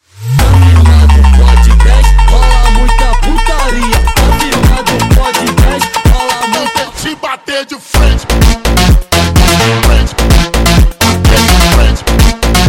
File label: Montagem sped Up, funk